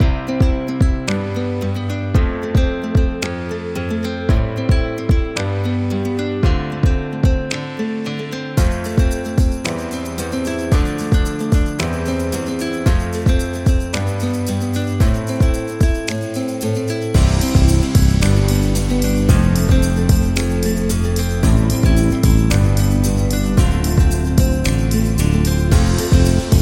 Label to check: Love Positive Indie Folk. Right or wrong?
Right